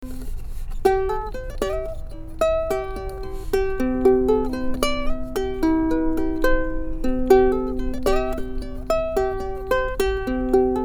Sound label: Kalimba uke tune